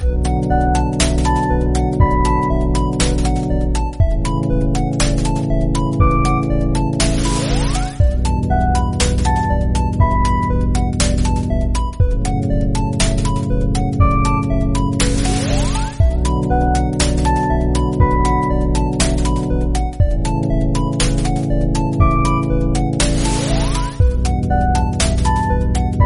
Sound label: boss reincarnation loop